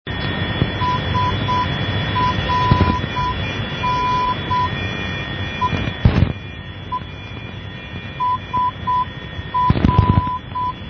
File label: SRN NDB